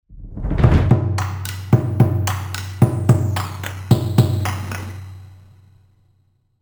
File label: Percussions; DC Big Knob